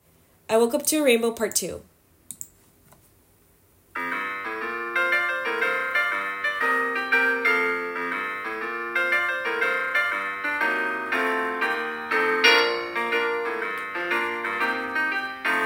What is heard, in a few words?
rainbow voice